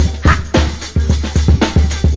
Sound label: 111 bpm, hot breaker, drum loops and breaks